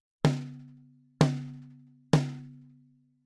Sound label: Snare vintage nickel; Warm Audio TB12; NT3